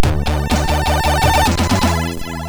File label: sid MOS6581R2